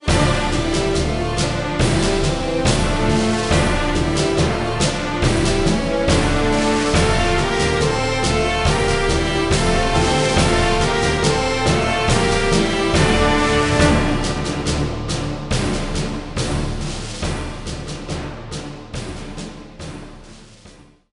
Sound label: German With Fade Out; trenches 2